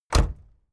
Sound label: car camaro close door